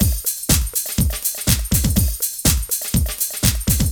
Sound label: drum loops